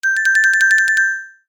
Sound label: cartoon blink flutter mallet; zapsplat